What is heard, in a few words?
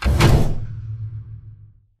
sliding door ext verb open stop; Titanfall 2